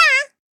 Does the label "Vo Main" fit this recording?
Yes